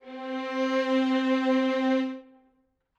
violin leg C4, Strings; M8; BBC

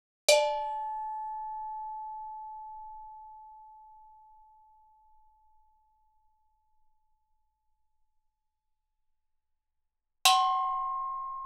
Gamelan; Propeller Island - Complete Gamelan

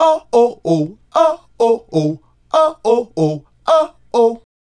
Male Group, Vocal

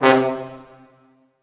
Orchestral Brass

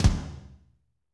TOM, Drumscapes Roland

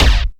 Ind Snare, dry snares; Quasimidi Rave-o-lution 309

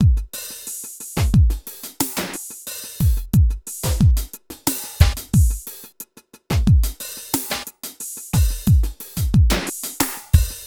Beat, Hip-Hop

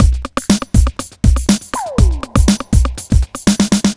121 Nu Skool Jam Fill, Drum Loops; 121bpm; Nu Dance Electro

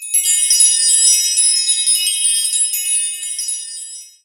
atmo; Techno Trance Essentials; FX & ATMO

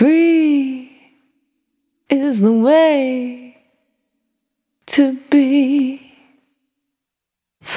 Free, Vocal Samples, Single Voice Melodic Loops; Singles

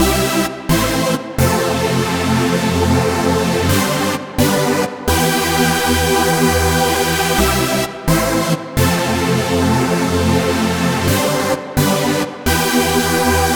SAWS

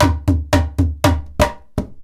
IJEILU GROOV; Best Service; World Colours

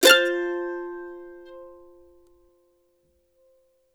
CEE CHORDS F, Blues Guitar Samples

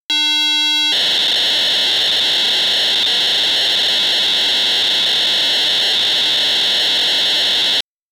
PO-32 data transfer sounds; Tonic Drums

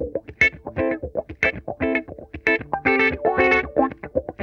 SYNCO, Funk Guitar; Zero G - Funk Guitar

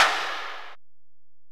EPerc, Alesis DM-PRO; 300 Drum Machines

